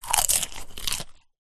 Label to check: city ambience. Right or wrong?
Wrong